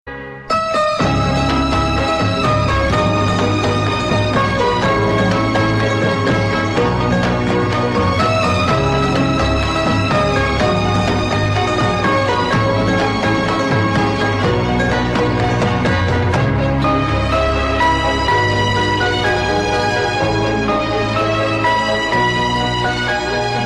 Sms Music

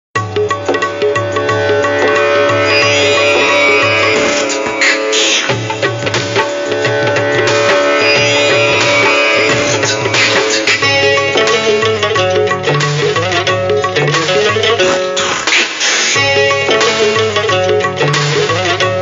Tabla And Guitar

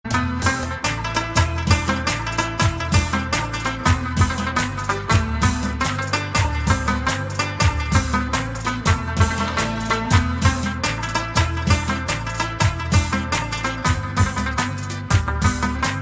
Rabab

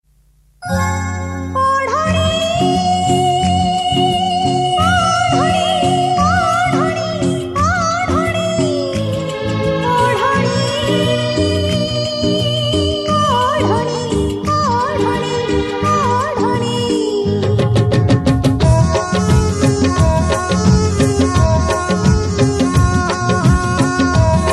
Oriya Ringtones

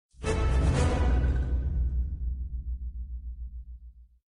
Combat Level Up; RS3

